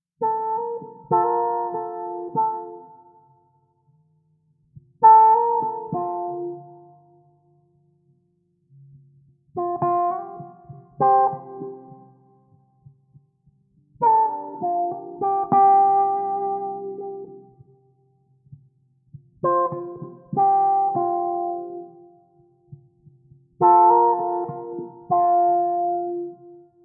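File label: F 100 guitar cliche groove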